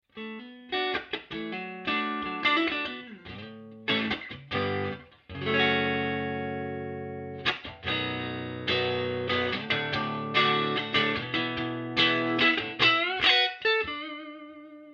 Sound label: clean